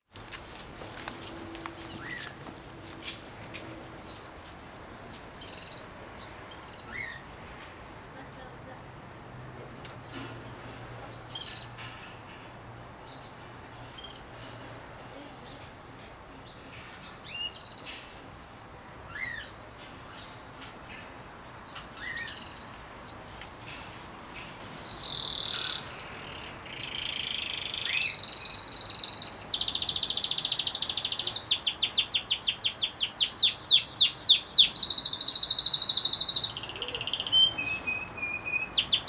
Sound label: canary sound